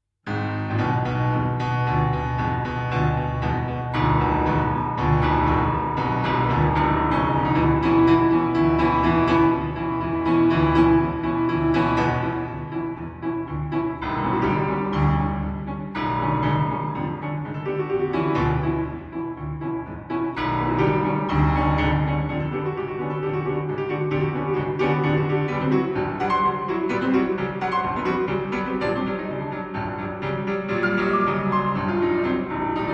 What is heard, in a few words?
Piano Sonata, Allegro Moderato